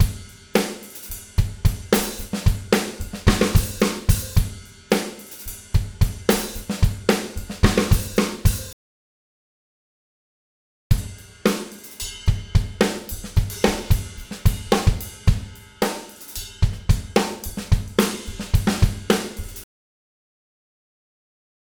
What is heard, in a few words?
Free Jammin Drumbeat 110 BPM; Ударные (Drum Loops)